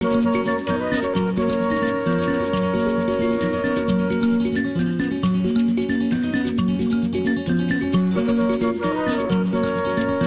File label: Traditional (Luganda)